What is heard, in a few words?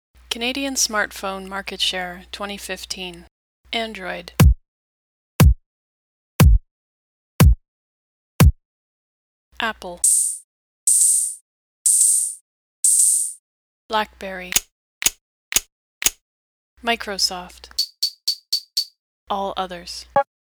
sonification percussive integrated legend